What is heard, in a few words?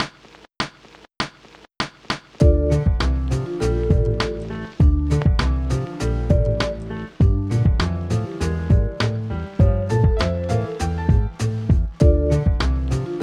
100 Electro Be Bop